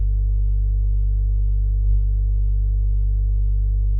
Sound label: 3D Whirr Idle; Martian Chess Audio